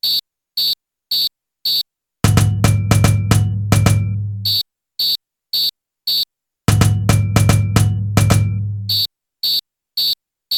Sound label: Ijexa break into section, samba